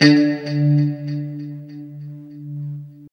LO-E D 3A, Guitars; Spectrasonics; Hans Zimmer Guitars VOL-1; JC-SHEEN

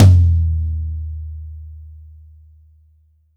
Y9 Tom; Akai MPC500

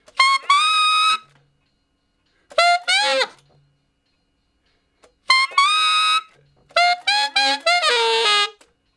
Ten Sax Straight (F), R&B; Solos

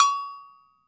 AGOGO BELL; AKAI S-Series CD-ROM Sound Library VOL-5

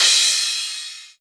Crash, Cymbals

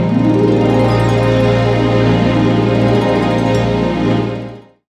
Harp Pad; Zero-G Jungle Warfare 1, 2 & 3; Strings & Things

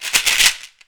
SHEKERA, African perc; AKAI S6000 CD-ROM - WorldSounds

